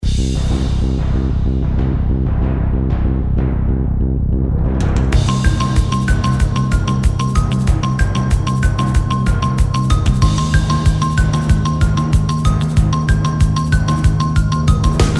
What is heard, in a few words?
bgm battle; Kardia Fantasy Mamono Hime-tachi to Bouken Monogatari; android